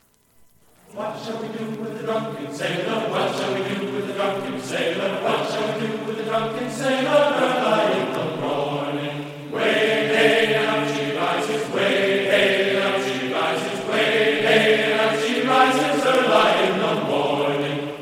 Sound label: choir ringtone; Drunken Sailor; USNA choir; Drunken Sailor ringtone in mp3 chorus